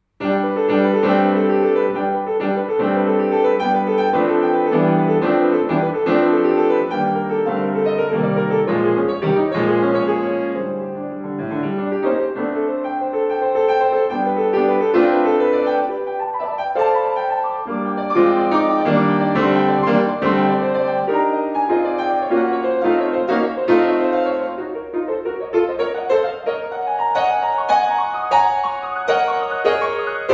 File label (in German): Schneller Walzer Vivace; variationssuite